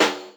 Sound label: Snares, Real